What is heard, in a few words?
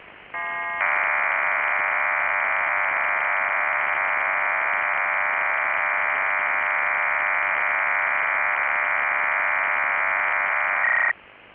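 22-TONE OFDM WAVEFORM (PARALLEL), burst; 22-TONE "SkyOFDM" OFDM / WAVEFORM (PARALLEL)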